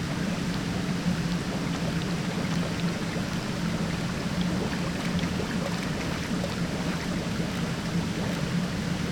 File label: Ambiance; Knytt